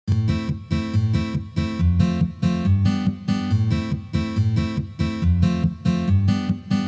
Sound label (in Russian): boj na gitare